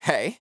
Dry Chant "Hey"; Cymatics; Euphoria Vocal Sample Pack; Chants

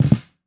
Snare Lo-Fi; Drums; Ghosthack - Free Snares 2023

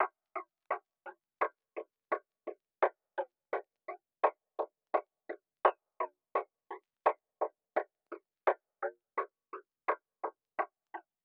Big Ben Percussion Loop - 85 BPM; Percussion Loops

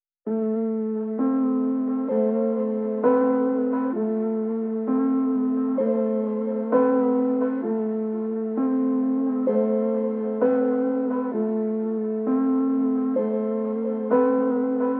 130 BPM A Min Piano, Loop Stems; Melodies; Melody Mystery Expansion; Smoke

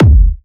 Techno, Kick; Techno Sounds 2024

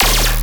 Bass Hit D#, Dubstep

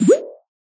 Retro FX; Cymatics; Video Game Sample Pack Vol 1

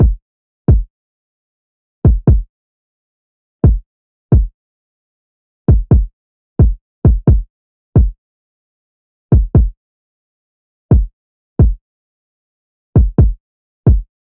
Drum Loop 132 BPM Kick; Loop Stems; Cymatics; DOPE DRUMS VOL. 1; Brunch Drum Loop

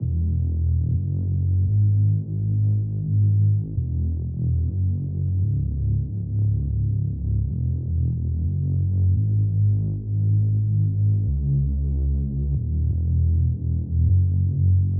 128 BPM G# Min Reese; House; Loop Stems; Cymatics; Breakdown Loops; Take Me Away

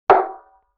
Barrel Hit Sharp; Vintage Cartoon; Drums & Percussion

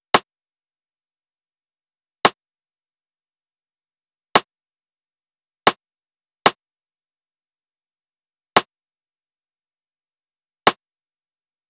Drum Loop, 114 BPM Rim; Loop Stems; Cymatics; Vibes II Drum Loop Collection; Prize

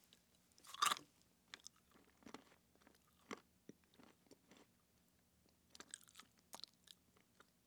Eating Peanuts Chips; Fairlight Sound Lib

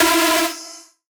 synth screech oneshot E dry; GhostHack

